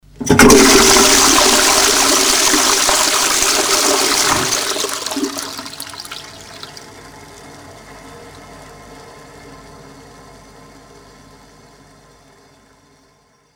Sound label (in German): Formatieren